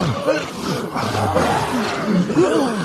Zombies

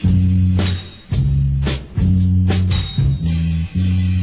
bassline loop

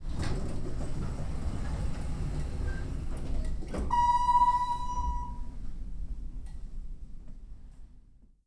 elevator door open

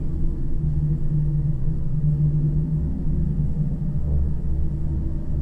elevator loop

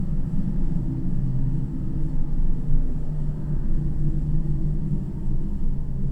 elevator loop

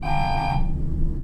elevator beep

